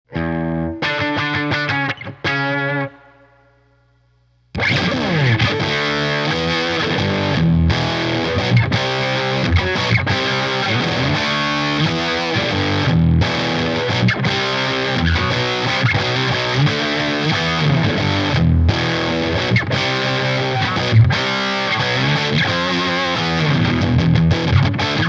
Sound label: The Bright switch and a good amount of Drive and Level push the amp into 60s early metal; Eye Ohm / Me